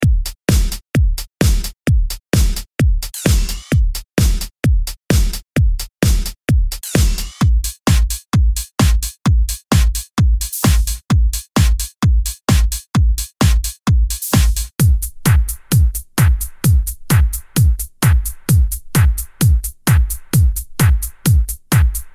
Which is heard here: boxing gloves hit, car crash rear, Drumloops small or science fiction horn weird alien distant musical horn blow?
Drumloops small